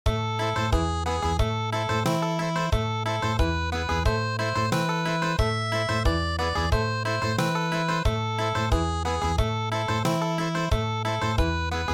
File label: Trio Country